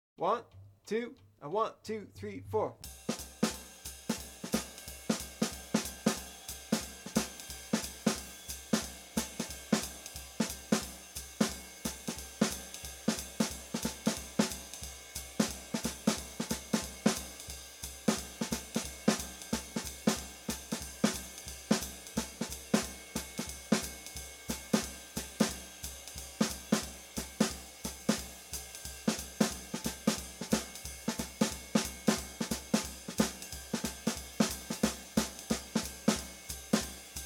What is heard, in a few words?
Comp Ex. fast, Bop Drumming; The Art Of Bop Drumming; Riley